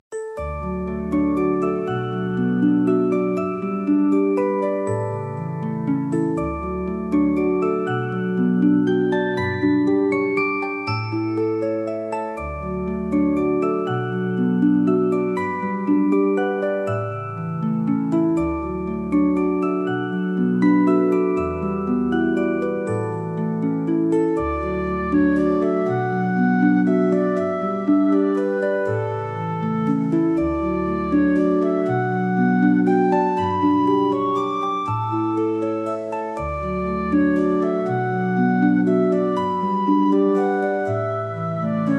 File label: celtic harp